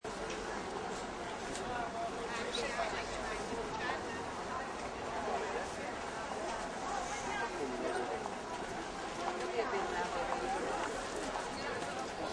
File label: Outdoor Market Light, Market crowd. Small outdoor market, light pedestrian traffic; Stalls and shoppers. People talking and walking past